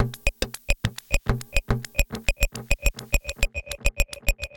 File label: Acoustic Guitar Hits 105 bpm; MIDI Clips; Drums; Ableton; Mood Reel